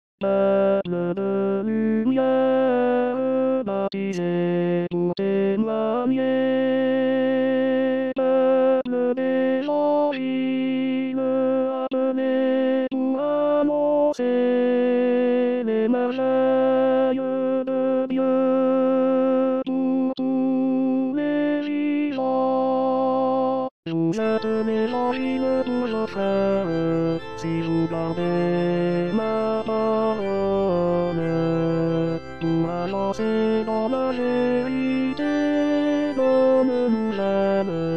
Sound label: tenor